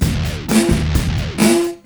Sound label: fx 129, Beat Loops; Zero-G; Monster Beats